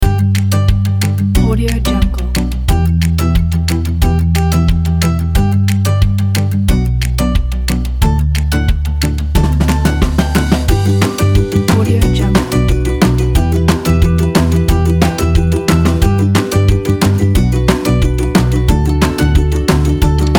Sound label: joyful kids